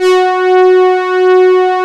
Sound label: SYNTHETIC, Strings